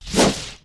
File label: whiz